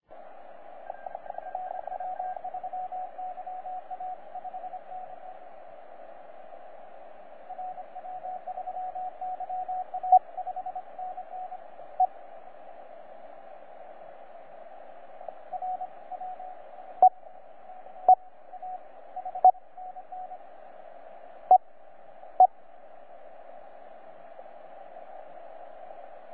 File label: CW